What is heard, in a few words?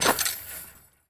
Street Ball Chain Swish; SFX Kit